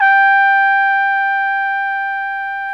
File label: BRASS 2 G4, ORCH BRASS; Greytsounds VOL-1